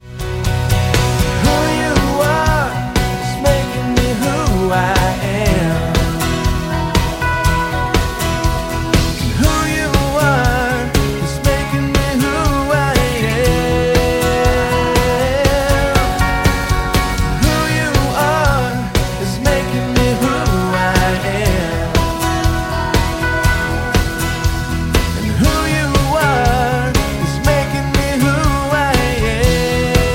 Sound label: chorus ringtone